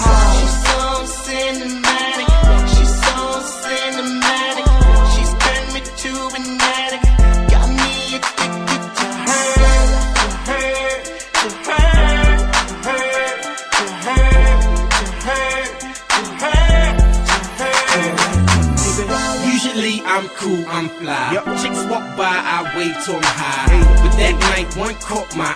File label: Cinematic